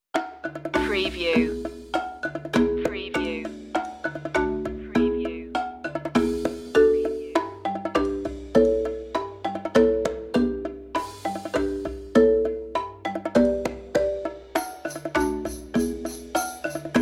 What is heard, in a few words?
no strings woodwinds